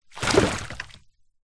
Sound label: foley wood stick large movement drag in puddle water; zapsplat